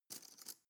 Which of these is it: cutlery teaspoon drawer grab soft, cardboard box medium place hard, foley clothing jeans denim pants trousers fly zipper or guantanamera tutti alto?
cutlery teaspoon drawer grab soft